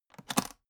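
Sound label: aluminium case small open short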